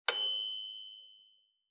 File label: timer alarm